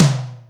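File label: Room tom, Boss DR660, lt; drum machine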